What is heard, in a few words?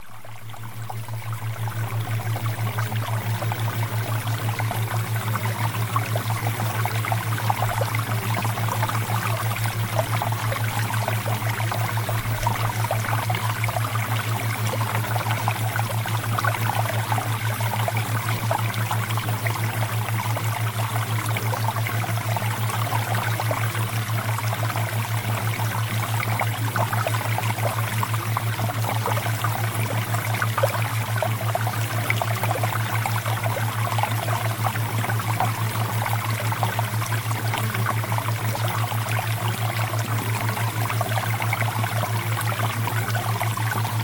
Water Theta Waves; CHAKRA HEALING